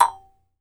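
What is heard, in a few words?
Jaw Harps, Pitched Percussion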